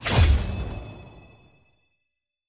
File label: button reroll click; champ select